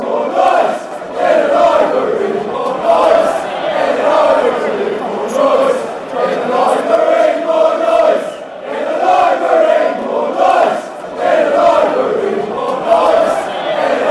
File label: more noise, chants; library